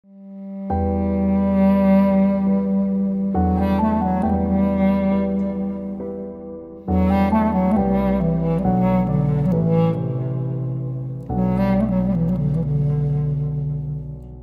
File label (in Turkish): klarnet cover, dizi muzigi; ezel